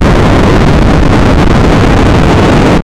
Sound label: reactor overheat